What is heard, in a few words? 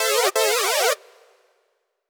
Stab; Sonic Foundry (Sony Creative Software); Underground UK House & Electro